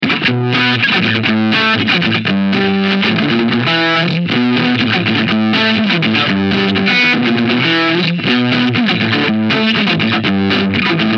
ハードギター; rock sound

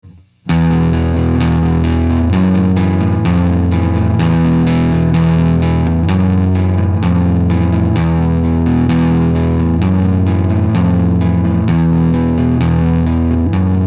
ギター アルペジオ

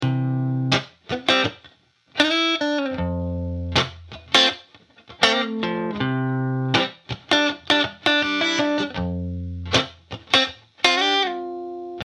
さわやかギター; rock sound

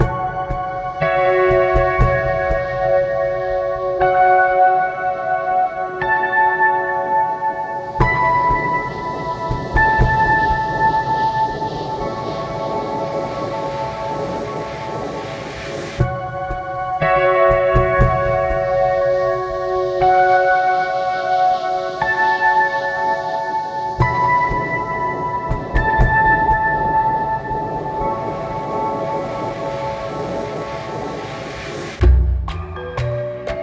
ゆっくり; yuttari sound

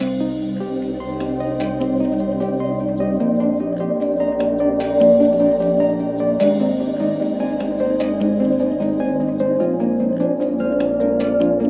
落ち着いた感じ; yuttari sound

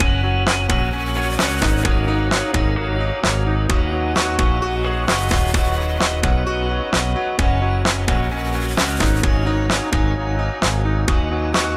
rock sound

